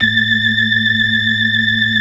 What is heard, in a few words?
organ; Keith Emerson Signature Series